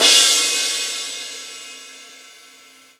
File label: Crash, Cymbals; Vengeance; Essential Clubsounds Vol.4